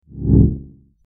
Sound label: whoosh smooth